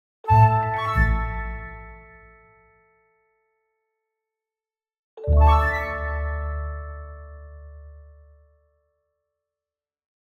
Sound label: Orchestral Jingle Positive; boom library; The Interface